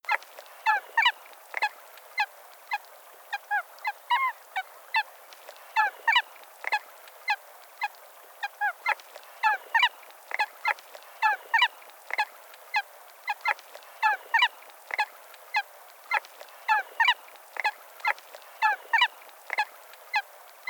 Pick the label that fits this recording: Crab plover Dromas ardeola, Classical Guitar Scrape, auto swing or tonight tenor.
Crab plover Dromas ardeola